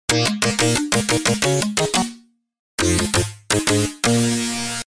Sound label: Androsynth